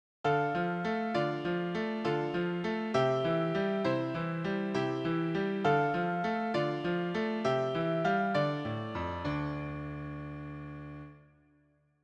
compound time

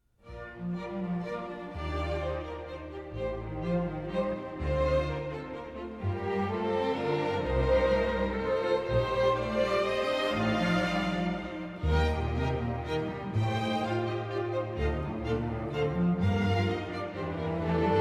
strings

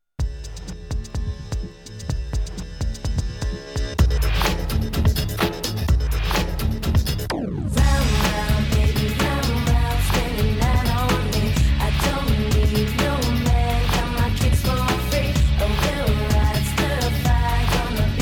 pop

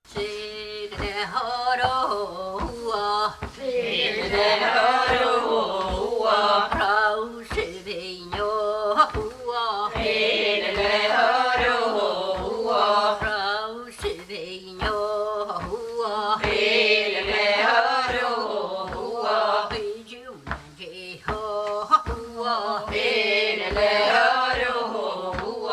waulking song

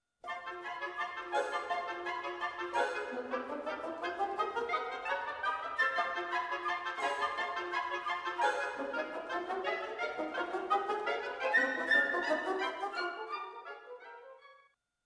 staccato

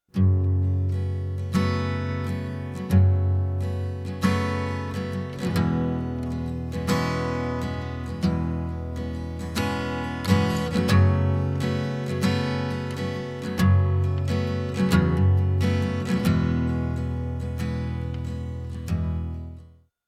strumming

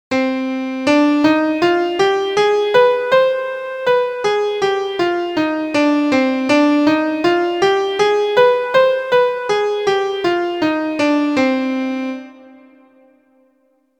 scale minor

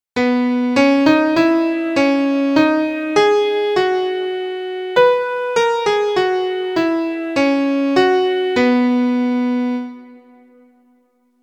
question and answer

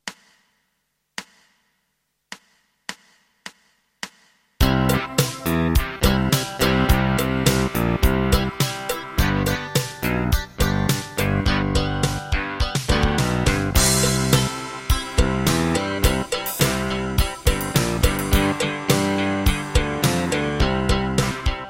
Two String Rock, Guitar backing

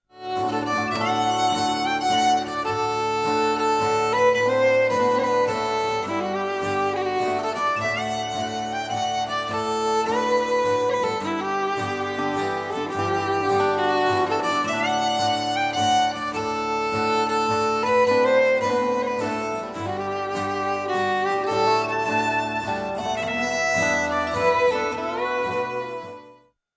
waltz

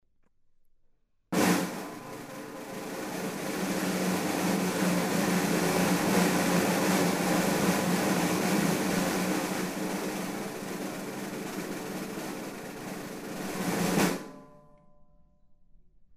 rolls

